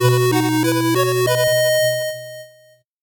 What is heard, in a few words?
8bit jingle normal